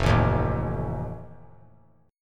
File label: F 7th F down; F7 strummed